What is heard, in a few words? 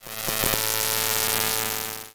JELLY ATTACK; sloodle